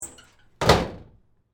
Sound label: Cabinet Door Close, Metal, Large; Foley; Cabinet Doors